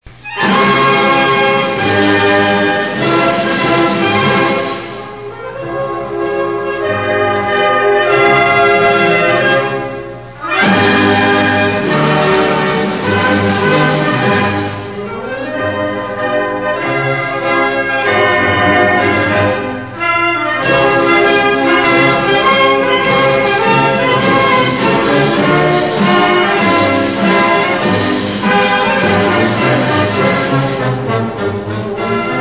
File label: National anthem of Nicaragua